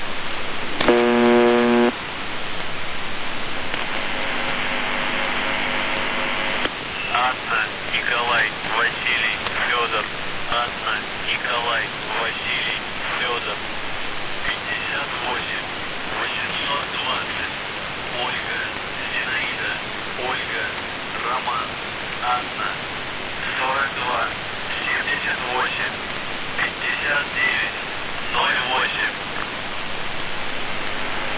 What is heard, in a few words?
buzzer usb msg